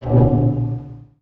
Menu Cancel

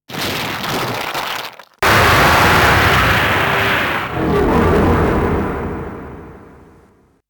Stage Introduction Ambient Music; snk arcade sound digital collection vol. 18

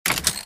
Slots Press Lever

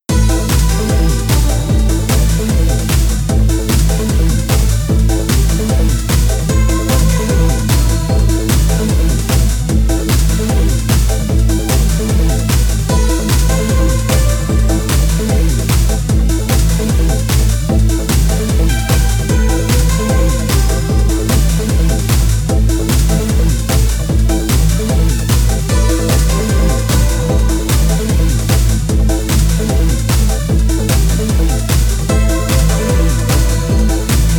Thunder and rain; rxn raijin

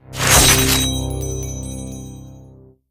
Ticket Doubler Activate; Angry Birds

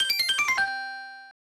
Pre-Title Jingle; Whirlo 1992 snes

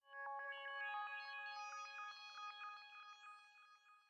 Calm; super mario maker 2; Peaceful SFX Raws - Otoasobi Calm SE

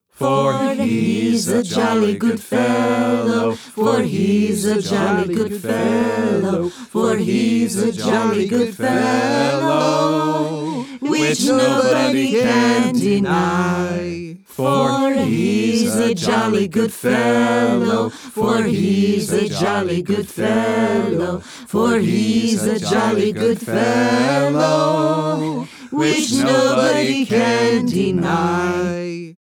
Voices birthdaysong; lucius

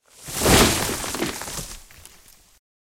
Forest Giant Fall

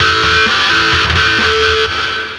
SFX Musician Success; life is a game android

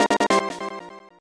Jingle, Levelup; english training have fun improving your skills ds gamerip 2006

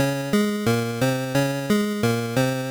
Fanfare; Arch Rivals; Game Gear

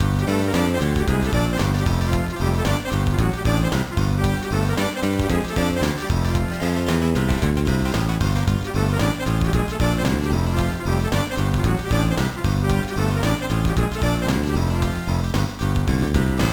BGM

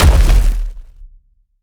Hand Hit; helltaker

